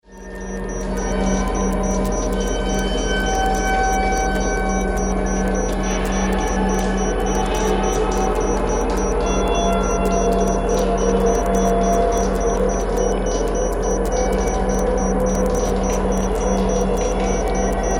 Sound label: Science Fiction Spaceship Abandoned Maintenance Room Electrical Buzz Beeps Water Drips